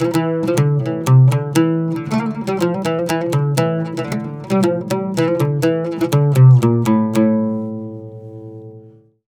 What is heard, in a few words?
120 oud